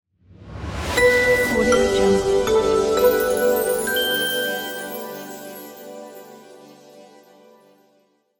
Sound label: christmas logo